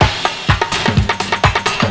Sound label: PERCS, 70 s Breakbeats; USB Soundscan vol.46; AKAI